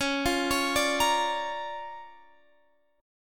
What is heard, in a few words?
D Minor Major 7th Sharp 5th, Db, guitar